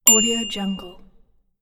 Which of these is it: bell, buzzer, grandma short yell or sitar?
bell